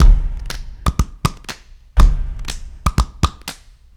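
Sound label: 120 bpm, elevator body; Spectrasonics - Supreme Beats - World Dance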